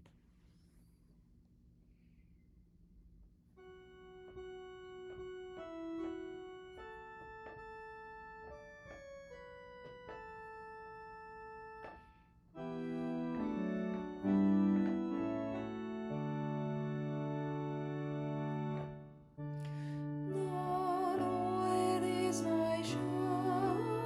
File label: BASS; The Lord Is My Shepherd